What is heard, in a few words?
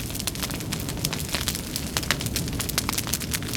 swamp Dark fire; SwampOfDarkness